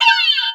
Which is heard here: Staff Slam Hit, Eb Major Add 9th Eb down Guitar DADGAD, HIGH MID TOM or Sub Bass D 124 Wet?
Staff Slam Hit